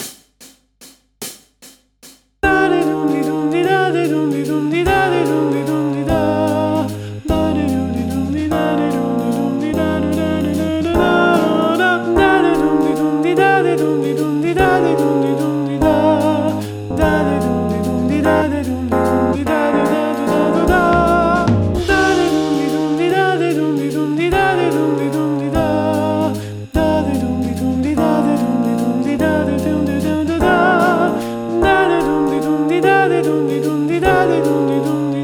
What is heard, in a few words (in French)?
voix 1